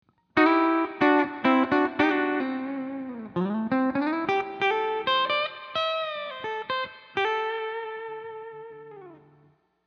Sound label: standerd guiyar solo